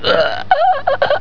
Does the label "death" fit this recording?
Yes